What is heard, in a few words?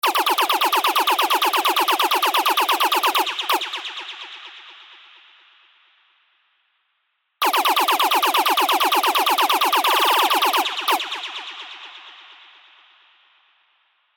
Lazer Shots WET (Em 130 BPM); FUTURE TECH KITS